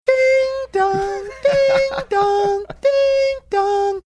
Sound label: singing ding dong ding dong ding dong